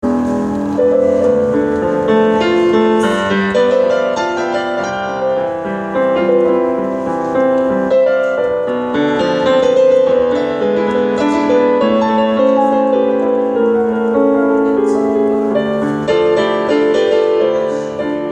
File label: brief piano; pt 2 of mist on the fells impromptu improv cont d from pt 1